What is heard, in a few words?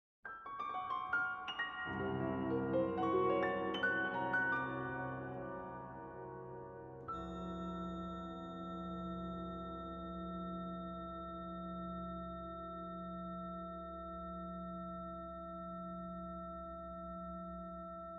Shadow of bells